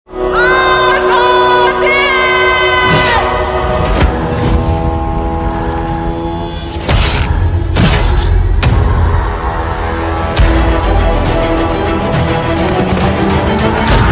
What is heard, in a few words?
manikarnika song ringtone